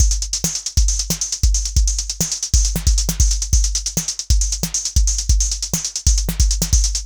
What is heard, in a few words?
Anabeat Mix, Beats; Sonic Foundry (Sony Creative Software); Prototechno